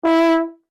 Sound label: HORN E5 FF SHORT, French Horn in F; Alexander French Horn in F; Horns; Musical